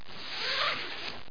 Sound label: zipper up